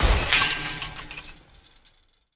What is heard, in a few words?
Chainlink Fence Break